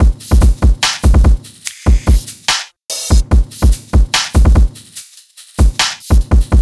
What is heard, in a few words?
Hip Hop Doubled 145 bpm, Drums; Loops; Ableton Live 12 Lite; Core Library